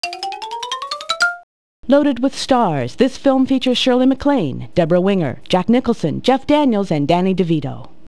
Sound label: xyla; CompuServe Multimedia Workshop February-March 1995; quiz